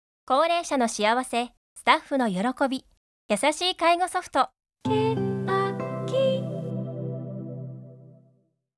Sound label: sound logo